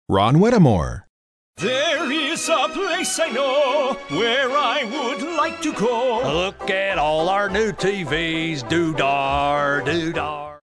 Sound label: singing